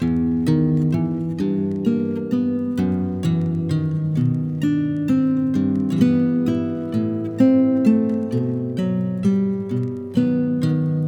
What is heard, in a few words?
guitar music